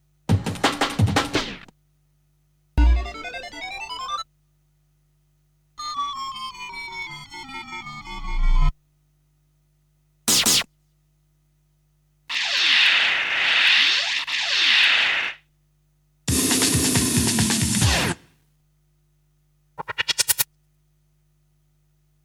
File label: Sound Effects; Kniteforce; 1000 Samples Volume 1